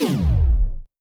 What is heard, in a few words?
Zap FX, 8-Bit; 8-Bit Kit Volume1